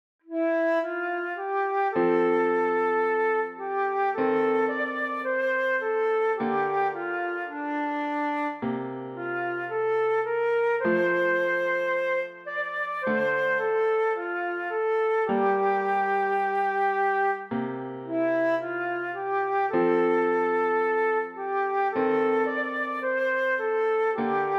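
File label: Danny Boy, Querflöte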